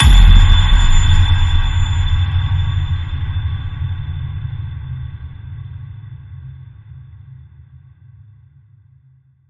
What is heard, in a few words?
High Ping